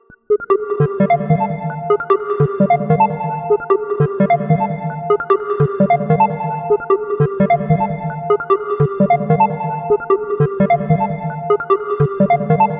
Spiral Drop, acid; 100 acid vol 3

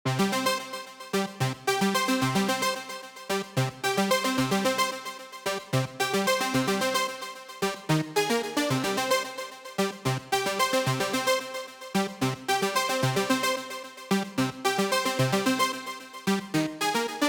Collapse Lead, retro 80s synth wave; retro 80s synth wave presets abx3 vol1

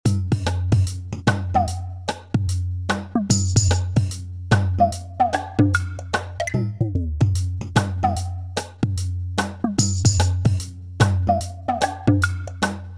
Soft Isolation Dry; distoblast

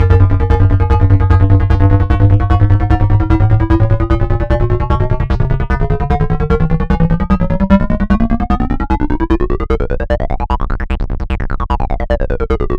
Octa Flanger Bass, acid techno trance; acid techno trance vol2

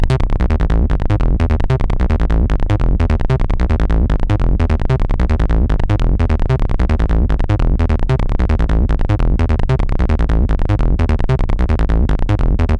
Trance 90 Bassliner; abx3 trance presets vol2

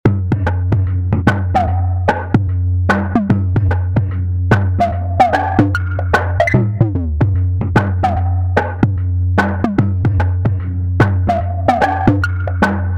Soft Isolation Wet; distoblast

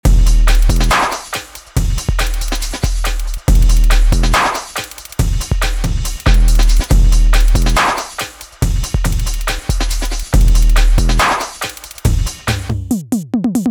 808 Trap; drum; beat; Drum Blaster: The Ultimate Beat Generator, Sampler Plugin for Modern Producers

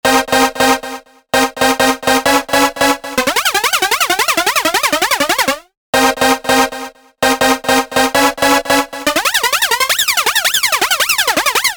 Classic Stab; rave generator bundle; Leadoover

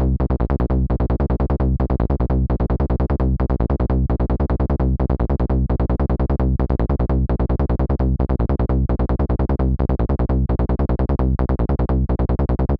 Trumble Bass, trance presets; abx3 trance presets vol2

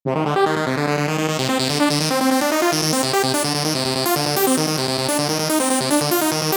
Bee Lead, 90s EuroDance presets; eurodance 90s vol1; Preset Bank for ABX3: EuroDance 90s Revival

